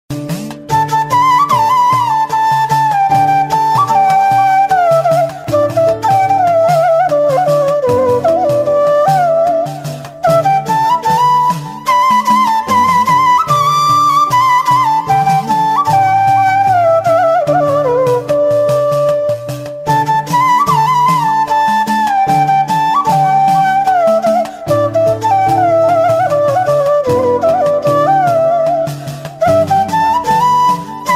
love bgm ringtone south bgm ringtone tamil ringtone telugu ringtone